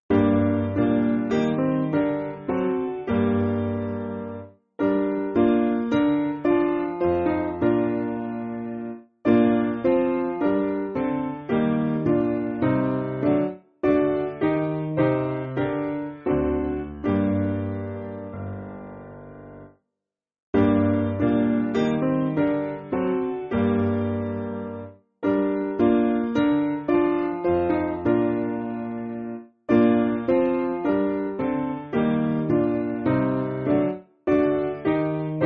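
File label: Piano, Music Backing Files for Churches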